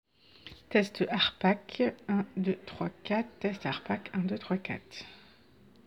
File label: Vocal